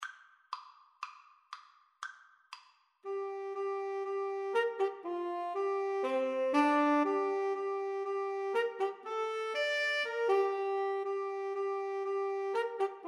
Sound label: tenor sax duet